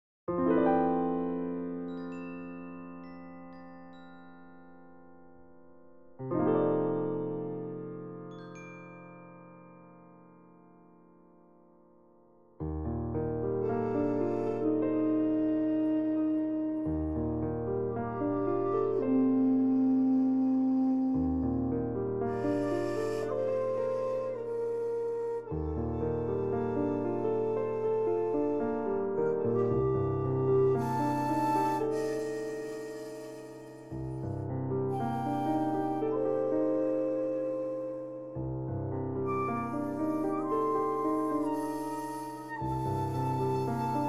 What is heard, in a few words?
music (Garden flashback calm); unsighted